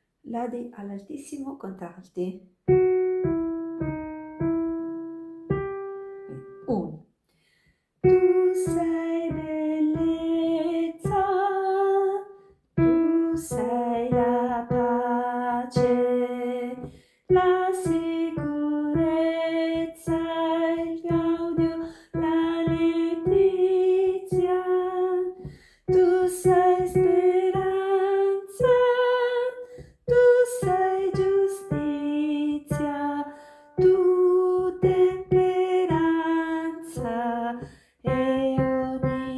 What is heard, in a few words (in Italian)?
contralti; lodi all altissimo